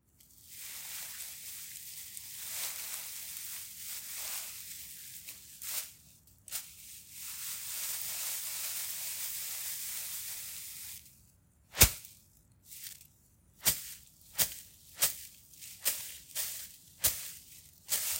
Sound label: Plants Or Ferns Manipulation Hit